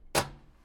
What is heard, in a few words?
Door Unlock